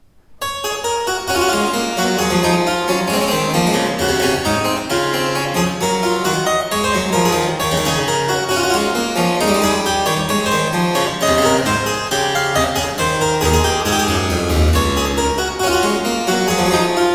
Suite in E flat major Gavotte; Suite No 4; BWV 815; Johann Sebastian Bach; JS Bach French Suites

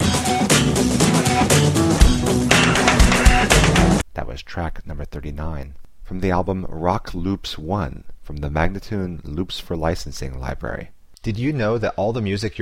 120 B spoken, Rock Loops; Loops For Licensing

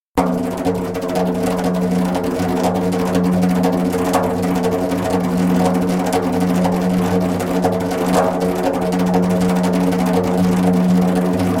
Magical Spins slow, Djembolale; Djembolale - Fills and Drills

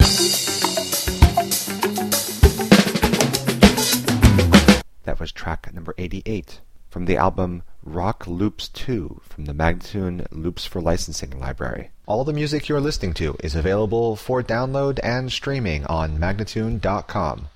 100 E spoken, Rock Loops; Loops For Licensing; MagnaLoops

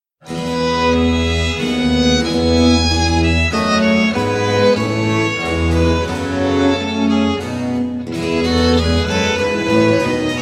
Sarabanda, spoken; Mensa sonora Pars II (C 70); Simplicissimus Ensemble

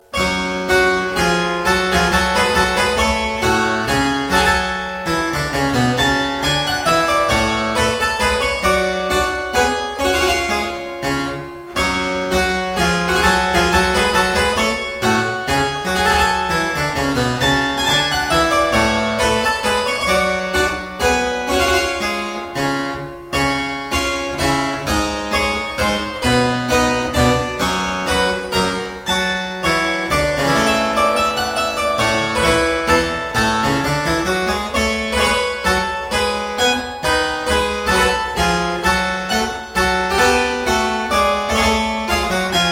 Suite no 4 in G Minor - Menuet, spoken; J Mattheson; Johann Mattheson - CD1 - The Twelve Suites of 1714

